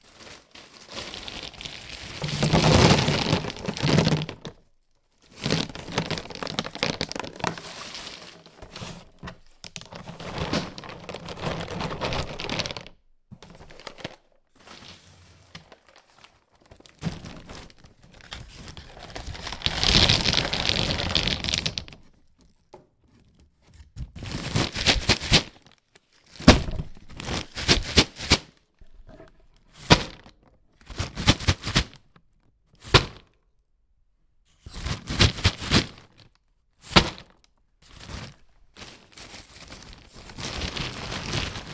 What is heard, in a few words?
Paper Bag Of Nuts